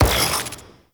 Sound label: Vial Ability Radiation Shatter; Warframe; Warframes; Alchemist; Elements